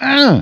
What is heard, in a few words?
pain